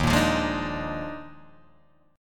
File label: Eb Major 7th Suspended 2nd Suspended 4th Eb Guitar Open G